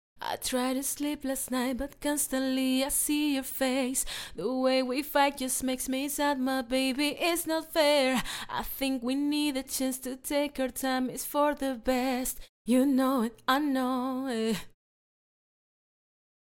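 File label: pop, 58, C major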